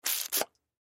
Squirt Toy Gun, Water Squirt Toy Gun Squeeze Spray; Toy Cars (Sound Effects:Toys); Blastwave